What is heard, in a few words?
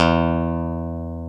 E-1 pick, pick bass; AKAI S-Series CD-ROM Sound Library VOL-7